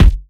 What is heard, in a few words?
Kick; Ableton Live 12 Lite Core Library